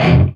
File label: CHUNK E, HEAVY METAL; Greytsounds